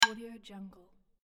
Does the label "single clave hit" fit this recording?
Yes